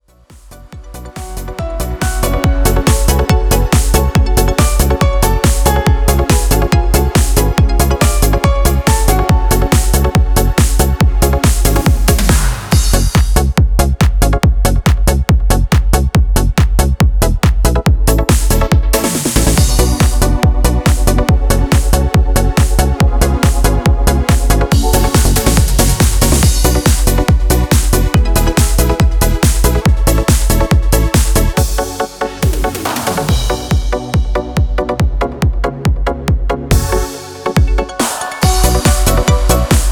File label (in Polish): Gitara Dance; TYROS 1; T1 STYLE; Genos